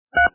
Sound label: DTMF 3